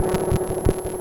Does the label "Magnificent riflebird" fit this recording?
No